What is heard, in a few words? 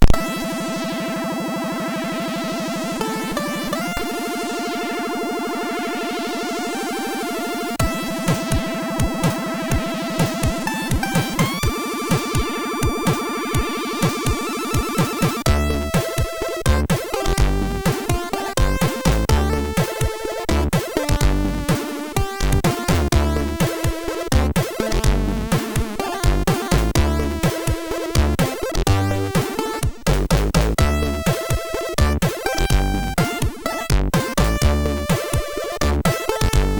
Coops Ups, MOS6581R3; hvsc